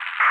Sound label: detectors contact